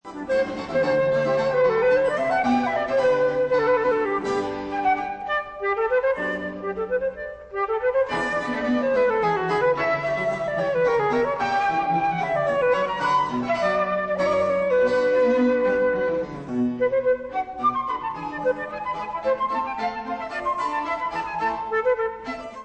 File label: sonata; j s bach sonata5